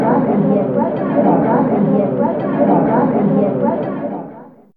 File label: People, Fairlight CMI Effects; Effects3